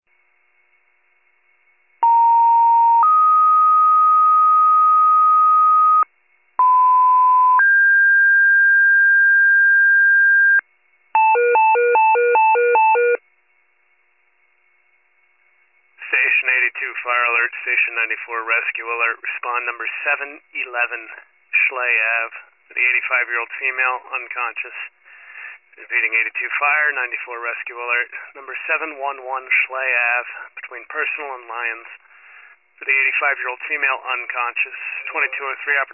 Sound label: fire, pager alert